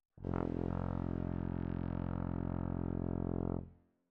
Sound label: Tuba, jazz for piano